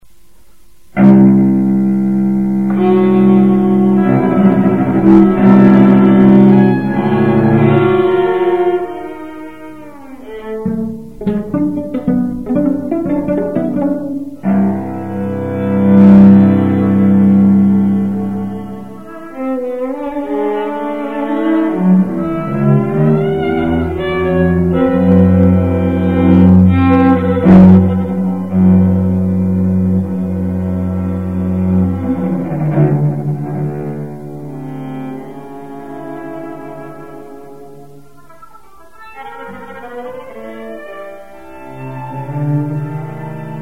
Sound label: trio